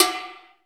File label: Mix Percussion